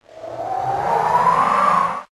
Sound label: Ice Burn; PRSFX- Ice Burn